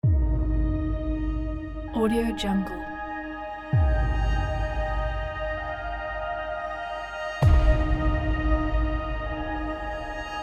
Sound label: fantastic trailer